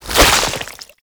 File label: Sticky Ink Tentacle Whip; Gameplay; Mutator; Warframe; Shadowgrapher